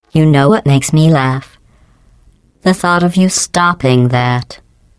taunt laugh; GLaDOS

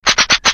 Defective Turret factory defect dryfire; sp sabotage

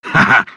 laugh, ru; Cave Johnson

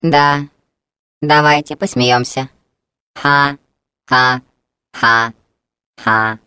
laugh; GLaDOS; taunt

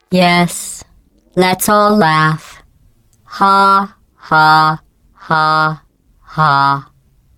taunt laugh; GLaDOS

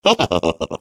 laugh, de; Defective Turret; sp sabotage factory defect

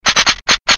defect dryfire; Defective Turret; sp sabotage factory

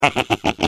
laugh, es; Defective Turret; sp sabotage factory defect

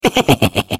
laugh; Defective Turret; sp sabotage factory defect

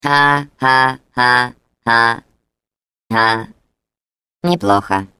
laugh; GLaDOS; taunt

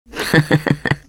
laugh, fr; GLaDOS

